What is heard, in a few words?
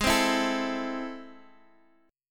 A Minor, G sharp; Am/G#; strummed; chordshape